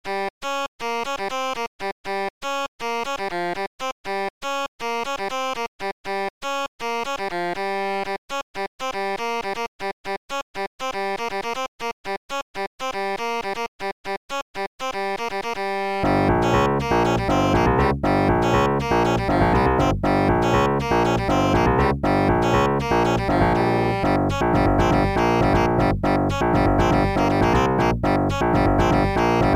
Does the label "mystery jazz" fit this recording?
Yes